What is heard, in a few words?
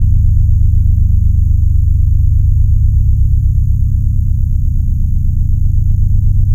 Drone Static; Drums & Drones